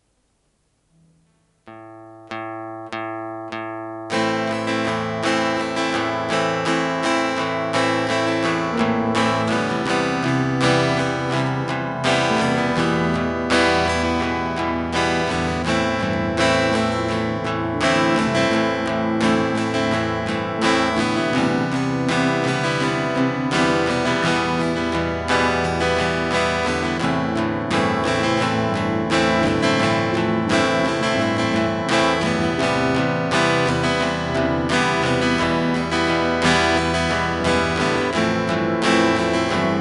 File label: Acoustic With BASS